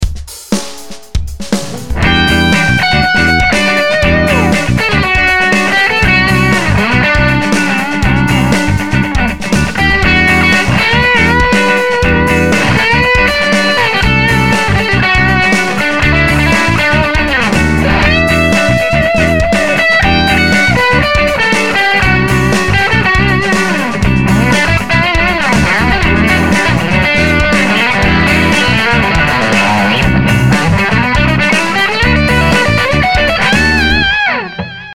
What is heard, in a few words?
Roland amp song, guitar